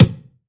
BD, BASS DRUMS; EdgeSounds

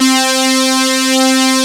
PANNER SYN, synths; Pro-Rec - Synthsonic

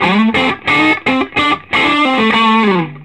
Vintage Blues Guitar, SOLO

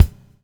BUBL; Spectrasonics - Backbeat

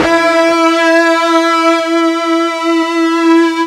FUZZ E 3, Guitar; East West; Steve Stevens Guitar Samples Collection

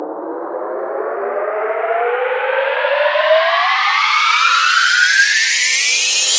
fx riser 150 elevate; Virtual Riot

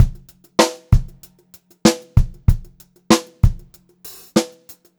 Pop-Rock Drumloops; 96 POP 2

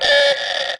Horn, cartoon Foley Fx; musicradar; cartoon caper

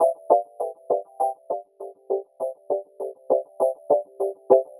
synth 100 chiming C Maj; Transmission-X

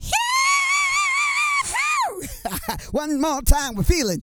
YELL, MALE HUMAN; Spectrasonics Vocal Planet

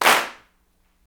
CLAPS, Africa Handclaps; Roland LCDP14 Africa VOL-2; Afr. Hands

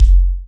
BD Real

